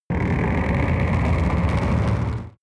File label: extrinsic buzz low, energie